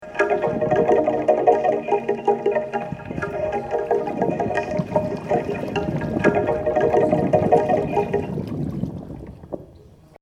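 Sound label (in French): eau soufflée percu; residence MDQ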